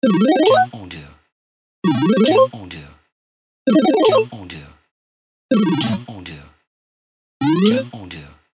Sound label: 8bit Game Set